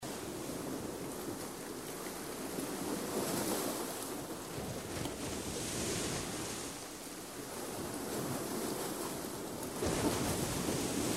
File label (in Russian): brizgi